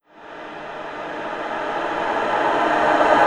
reverse, vocal sample

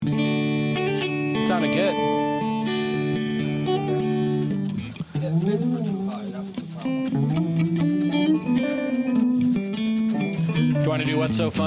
Background Noise Mix

